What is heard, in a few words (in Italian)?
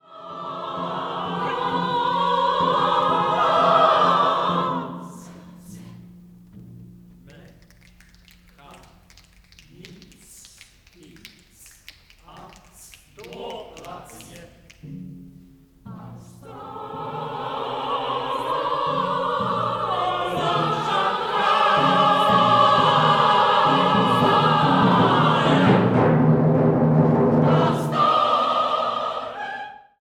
Canto